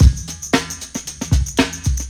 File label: breaks